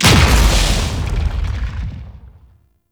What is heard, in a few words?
Sentient Vessel Step, Enemies; Warframe; Tau; Movement